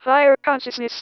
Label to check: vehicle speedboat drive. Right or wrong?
Wrong